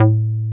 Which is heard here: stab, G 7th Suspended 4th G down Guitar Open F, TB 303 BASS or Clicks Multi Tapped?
TB 303 BASS